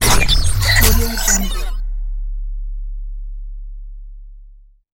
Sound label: futuristic interface transition